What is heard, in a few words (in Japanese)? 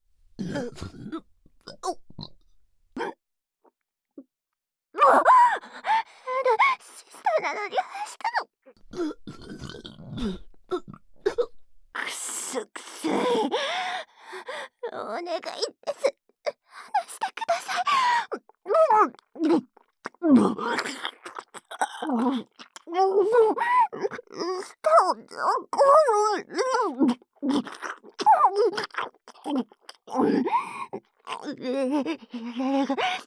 ゲップシーンまとめ